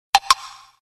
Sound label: MISS; UISound